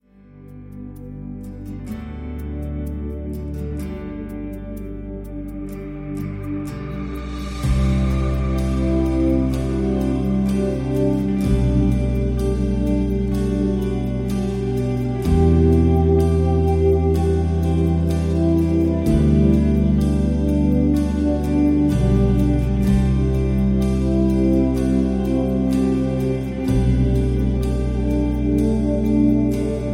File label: rhythm